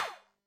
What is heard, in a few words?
tower shoot, tower defense; freepixel